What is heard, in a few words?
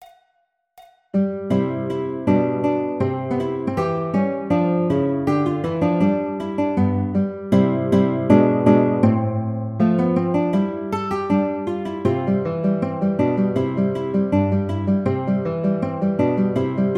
Andantino Guitar, classical; Carulli Op 241 No 20